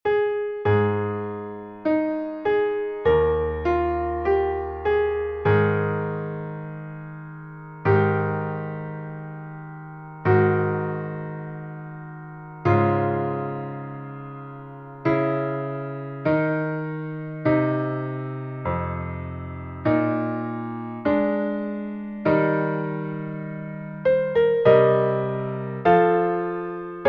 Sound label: sax Piano; sax contralto; adeste fideles; Spartito per sassofono contralto, soprano, tenore, o baritono